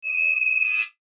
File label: Mouseover Ascend To Chirp; Multimedia; Blastwave